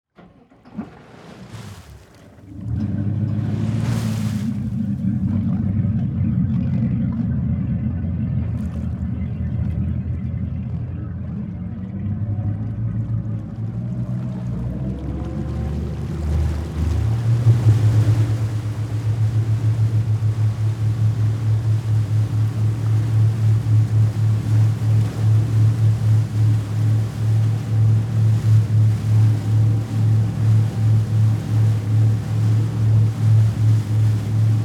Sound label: Boat Start Run, Slow, Speed, Off, Dual, Engines, Deck, Perspective; Transportation, Watercraft; Police, Marine, Division, Cherokee